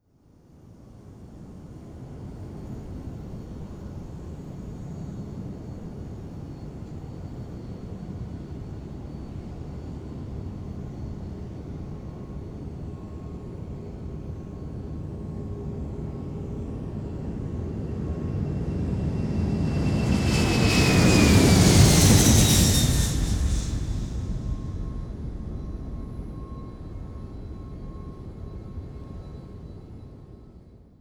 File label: Jumbo Jet Takeoff; Sony Pictures Sound Effects Series; Vehicles